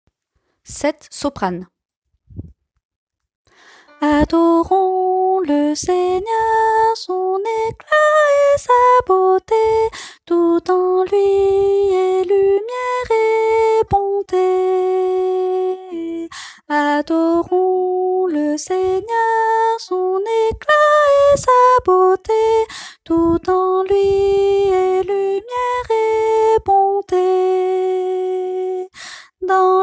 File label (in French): soprano